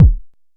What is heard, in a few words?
analog deep, kick; Ableton Foundation FreePacks